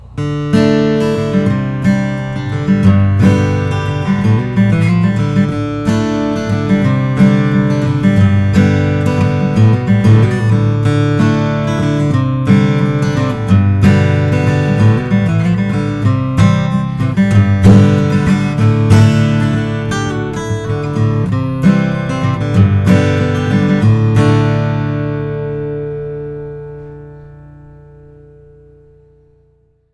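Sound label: Martin custom 000 12 fret all mahogany; natural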